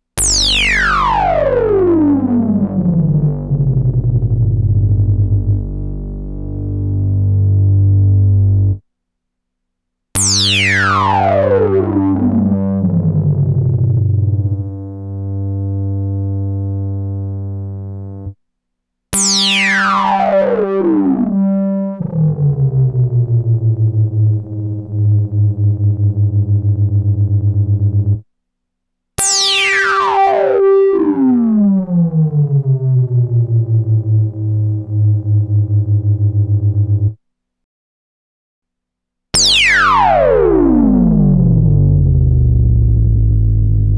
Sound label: Volca Bass L MS20 mini R